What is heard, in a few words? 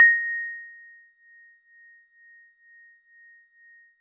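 Warble Celeste DX100 A#0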